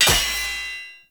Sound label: Short Sword Medium Impact Metal Shield